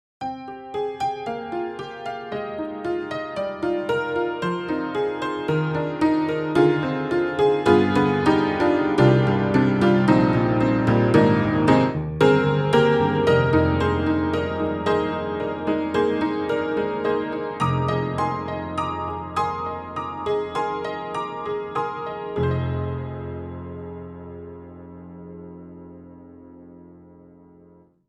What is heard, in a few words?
Upright Pianos Barroom Upright Piano; Synthogy; Ivory II